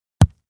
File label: Ground Hit Sledge Ham; rocks & dirt